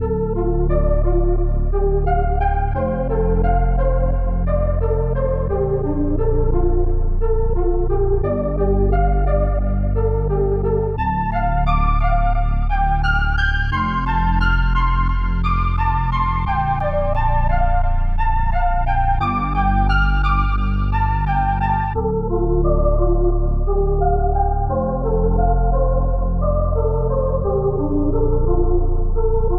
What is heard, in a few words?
175 D Min, Melody Loops; Cymatics; Telephono